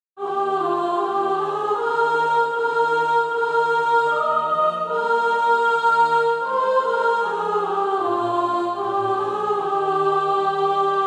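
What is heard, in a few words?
sop, Practise Music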